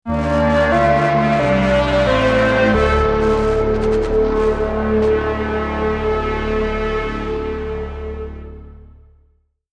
Appearance Fanfare

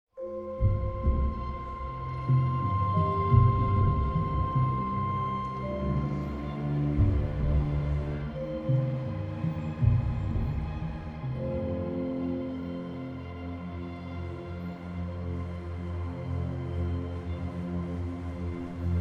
World Music

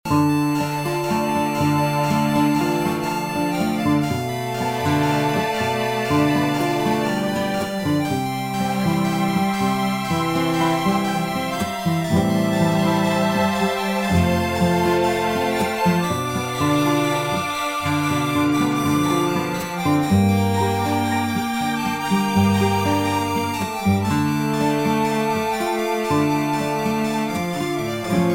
drama Loop; Blacksmith Story; android

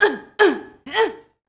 female choke

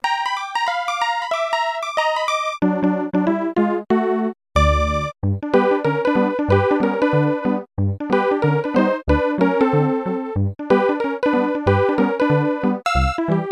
Ragtime (Aircheck); animal crossing full gamerip

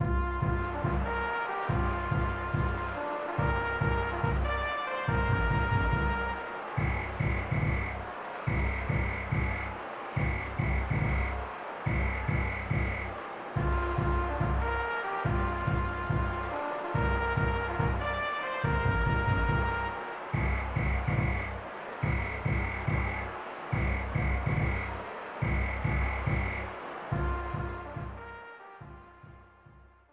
Baseball

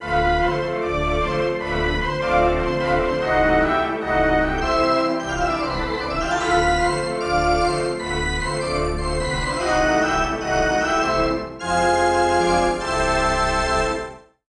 Organ Results; American Mensa Academy; 3DS

